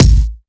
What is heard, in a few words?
kickdrum G sharp key